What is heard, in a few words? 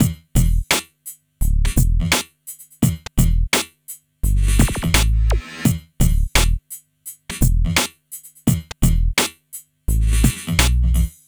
Drums Add FX Bass; Logic Fun